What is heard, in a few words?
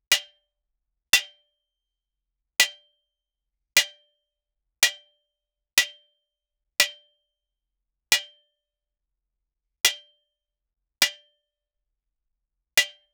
a metal bat hitting, bat hit